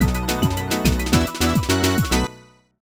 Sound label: Boss Round Clear